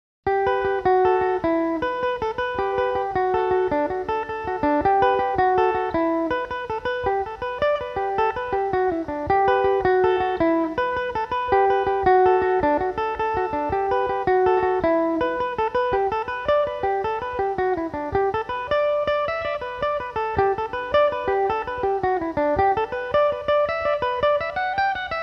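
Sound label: jig D major belmuse, irish mandolin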